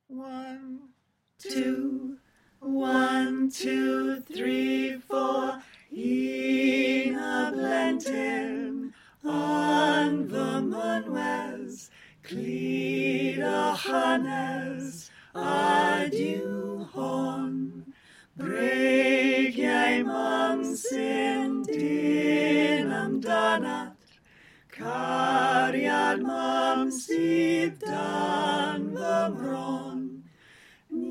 Alto Melody panned R; Folk Choir; Suo Gan Resources

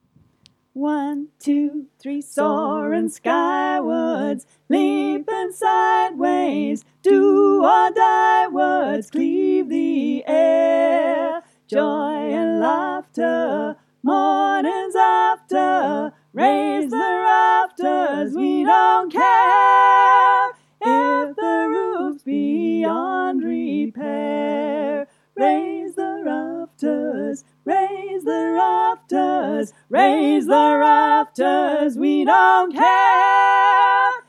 Unison in Harmony both parts equal, Folk Choir

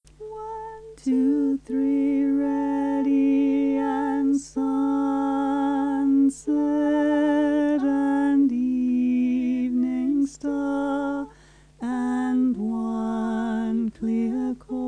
Melody, Folk Choir music

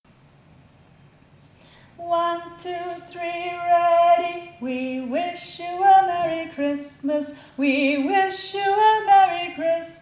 We Wish You A Merry Christmas MELODY SOLO; Folk Choir music

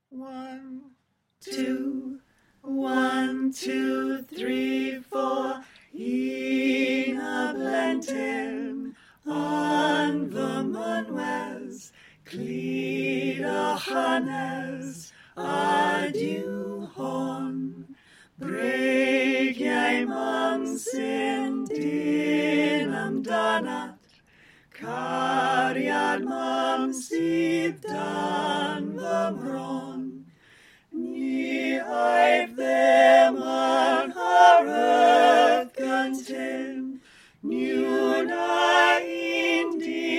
Suo Gan Bass panned R; Folk Choir; Suo Gan Resources

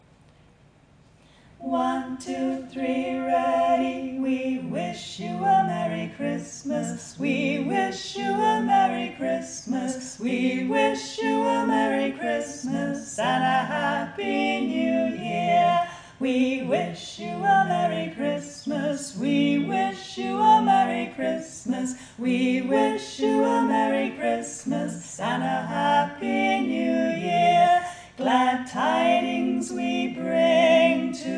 We Wish You A Merry Christmas MELODY PANNED R; Folk Choir music

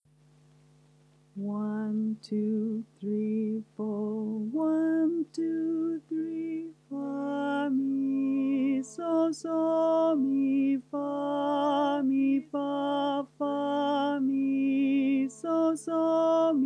Auld bari solfa chorus; Auld Lang Syne Resources

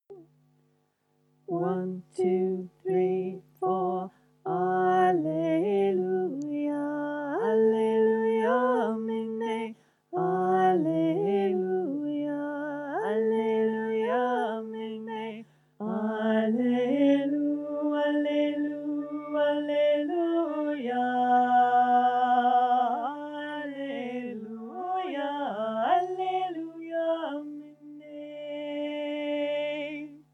Bass 3 times

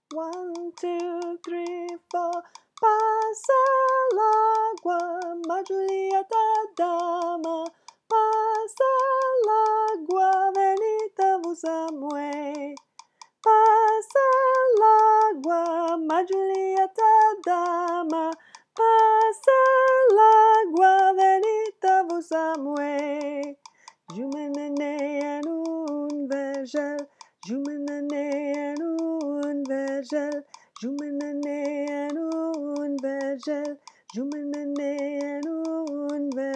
high part; Pase el agoa

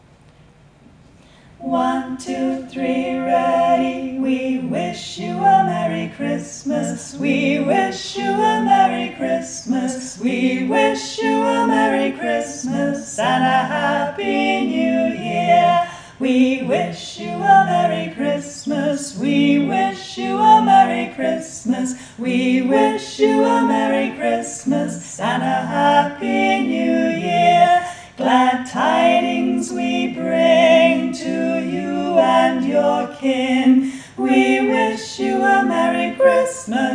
FULL ENSEMBLE; Folk Choir music

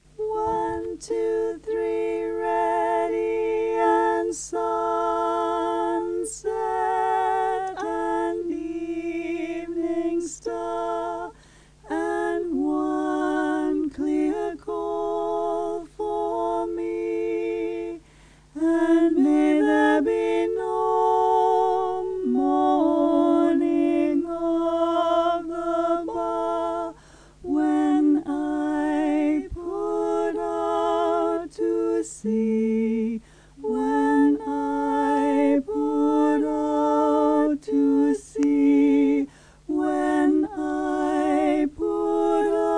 Bass; Crossing The Bar; Folk Choir music

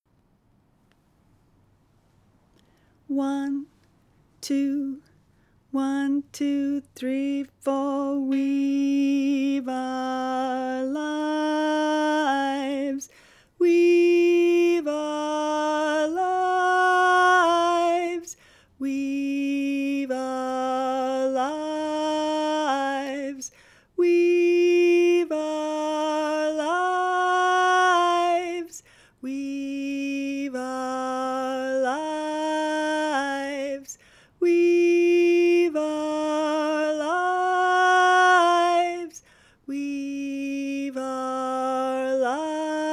middle and slow

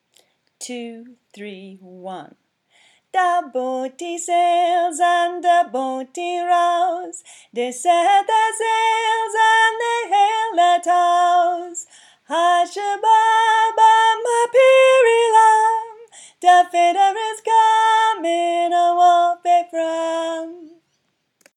Da Boatie high part, Folk Choir music

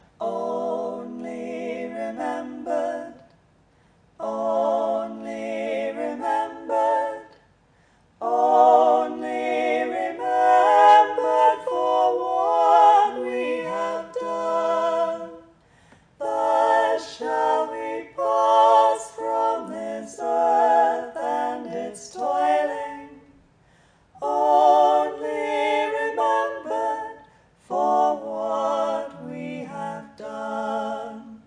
ch sop bari panned R

